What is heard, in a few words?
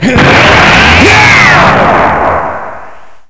scream; trunks-fut